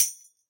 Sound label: TAMBOURINE